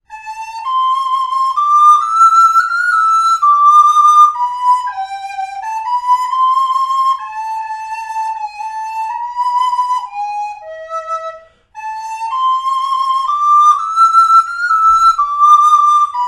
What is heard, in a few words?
green sleeves soprano, recorder; Tategoto